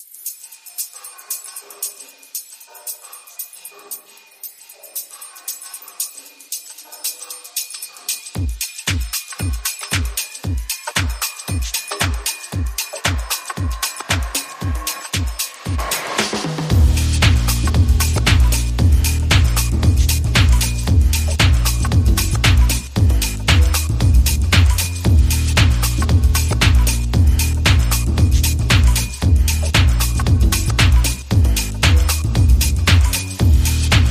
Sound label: Drums Bass and FX Only